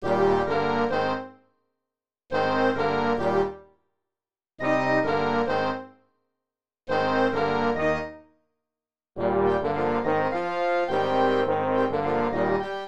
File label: House Party; Crowd Teaser